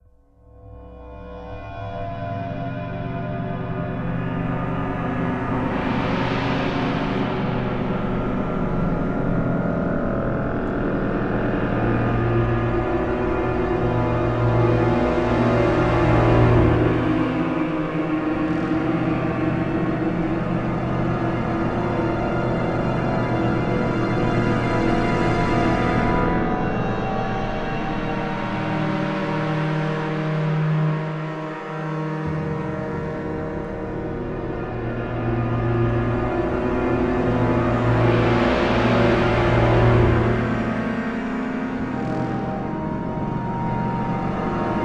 Music Clusters Pitched Down; starcraft 2 wings of liberty; MissionMusic

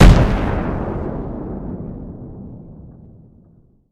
Explosion; Pain Train

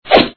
Hit; pencak silat 2002 gamerip online

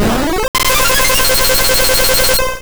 Aeroblast; sfx; attack moves; pokemon; gen 2; gsc

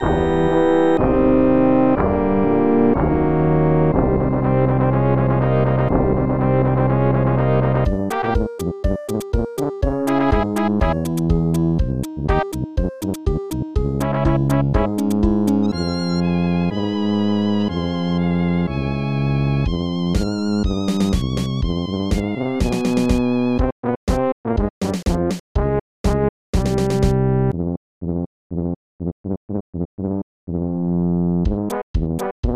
Caribbean; Ghoul Patrol; snes

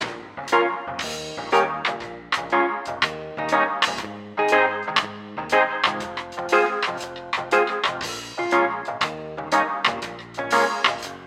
Jukebox Reggae

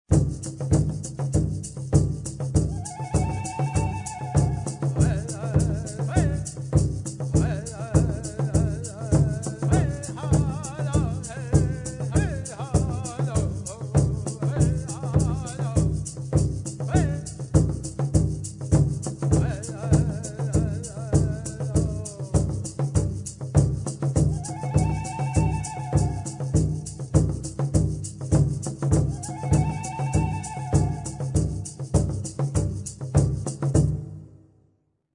Happy Village; sid meier s civilization revolution 2 2015 vita

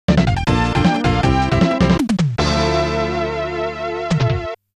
GAME OVER; Wild Guns

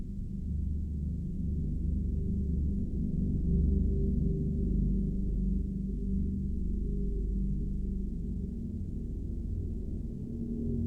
drone

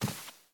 Step Slippers

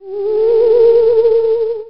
Jingle Halloween

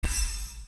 Hit

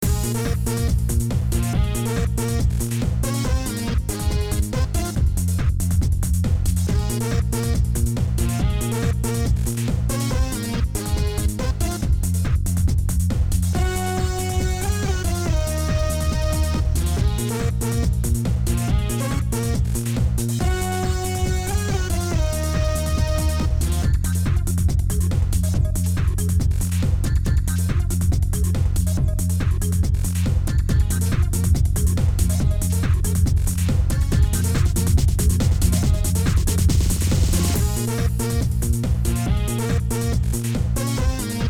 Boss Fight

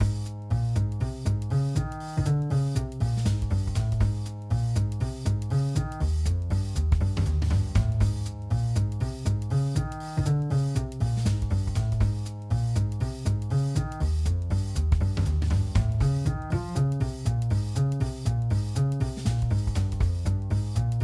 BGM rhythm; game builder garage 2021 switch; Node 01

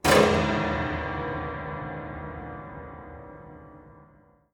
scary zoom; lucius

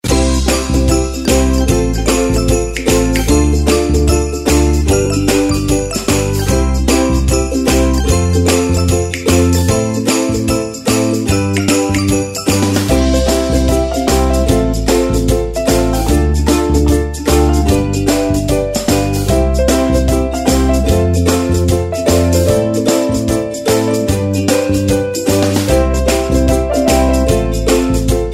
Happy Commercial Tune; beat pangpang for kakao mobile

